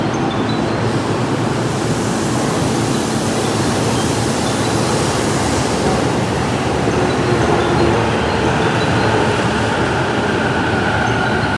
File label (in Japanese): JR川越線接近, train sounds